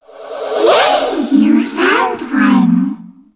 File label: dark zm idle, zombie; zombie plague